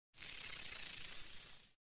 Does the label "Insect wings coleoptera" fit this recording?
Yes